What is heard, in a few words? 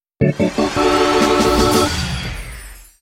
Hudmessage Fanfare Positive; Burnout CRASH!